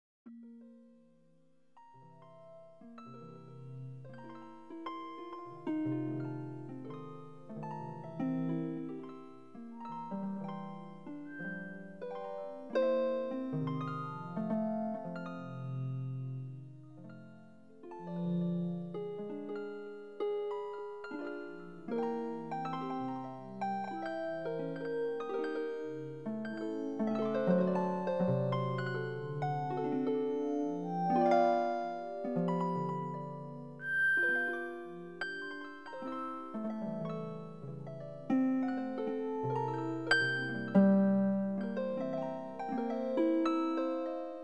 twelve bar blues chords

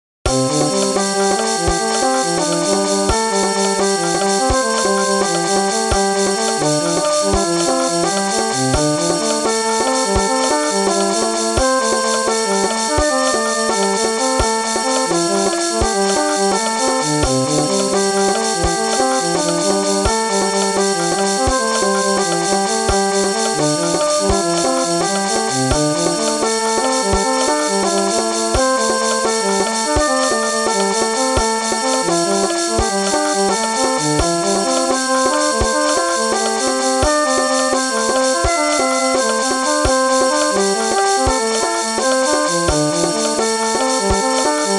Slendro scale polyrhythm; fractal music

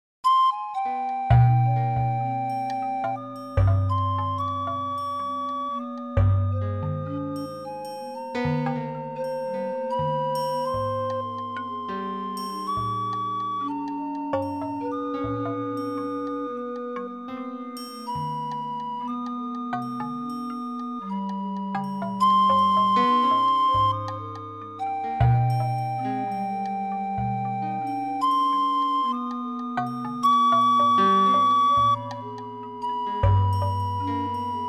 music for a wild polynesian party, fractal music

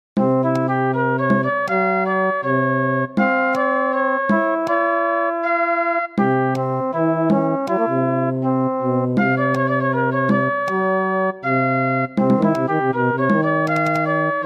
golden meantone

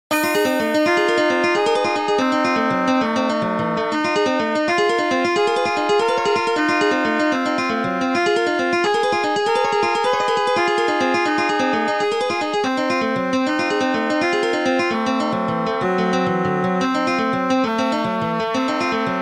Upside down harmonics 12th harmonic upwards

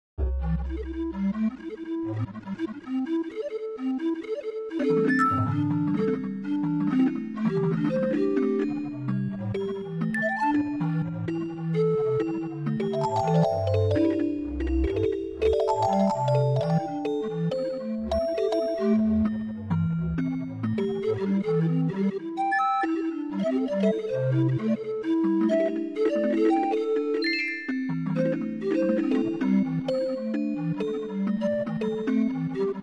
comma clouds fast

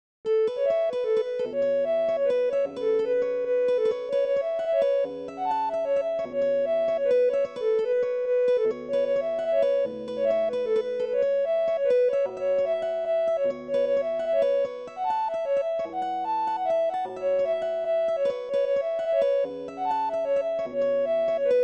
7 5 4 polyrhythm, fractal music

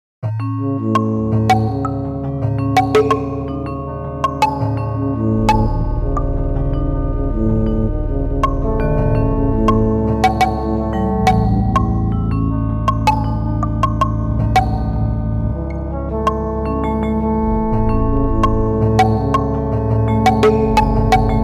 kalimba bongo; hocketting

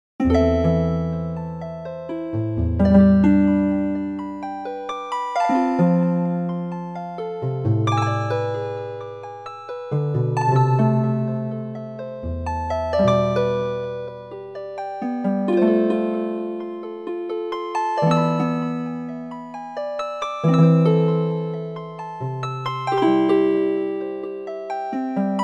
greensleeves chords just intonation harp

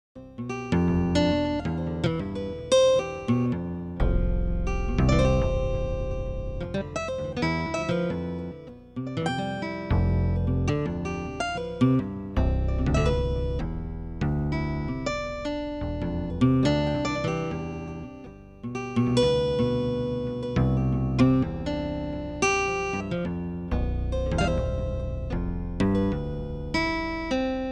guitar polyrhythm; fractal music

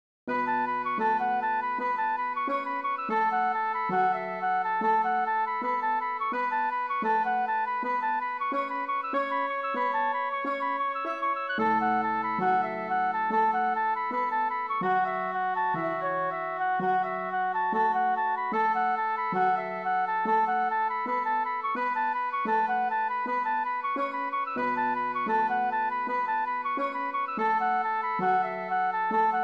flute with accompaniment

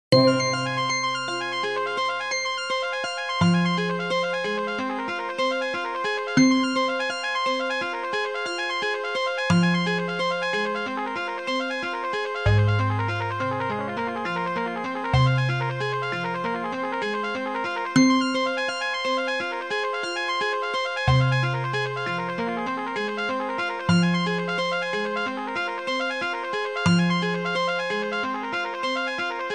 Upside down trumpet

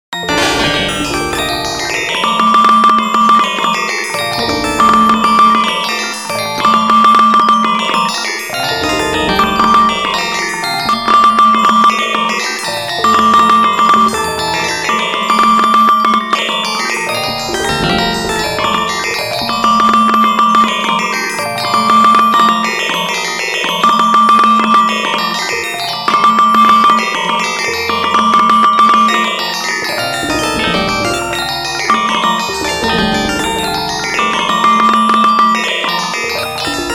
vibraphone texture

